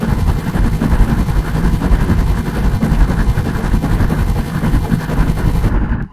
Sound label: drums; itsteals; legs; slender